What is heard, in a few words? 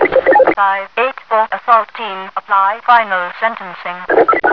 radio; overwatch